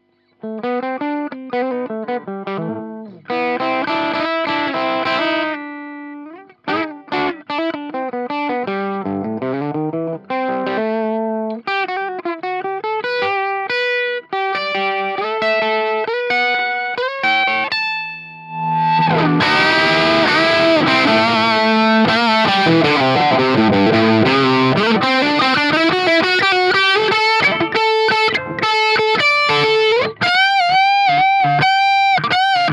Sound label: Lead